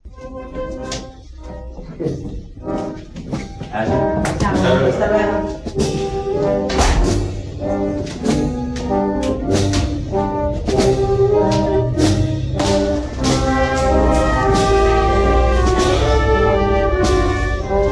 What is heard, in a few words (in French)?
Musique fanfare